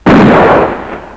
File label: pist; doom